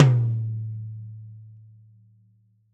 large room tom drum a key

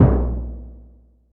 mid tom one shot b key

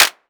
clap sound g key; percussion; single hits one shots

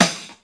treble heavy snare single hit f key; Duration 0:01; Mono; snare drum samples

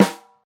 snare drum g key; snare drum samples; drum single hits one shots

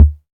good bass drum sound d key

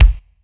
bass drum F# key; kick drum samples; one shots

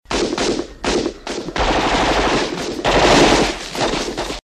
progressive gunfire

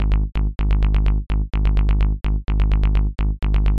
bass am, Bass sequence; loops

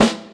sharp snare a sharp key

